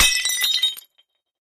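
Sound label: Dish Ceramic Plate Crash, housewares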